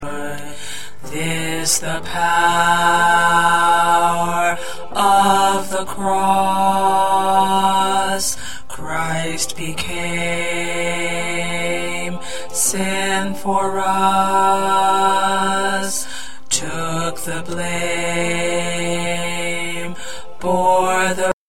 Baritone

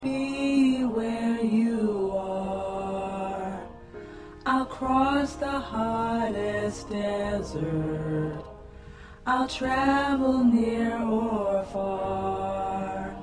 SATB Tenor

